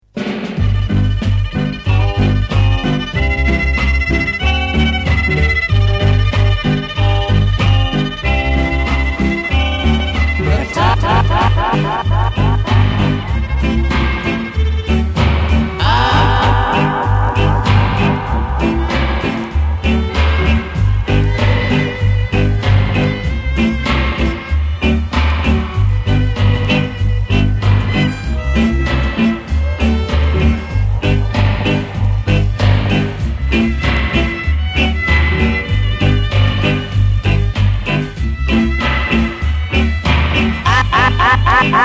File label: Dub With Strings; Pressure Sounds